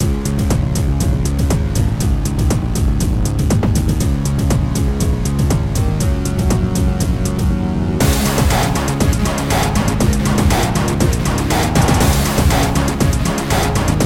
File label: Instrumental; friday night funkin vs. umbal family computer